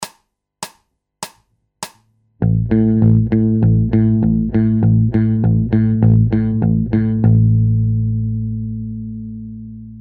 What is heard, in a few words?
Bass Loops